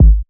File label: original bass drum one shot g sharp key, kick sound tuned to the G# note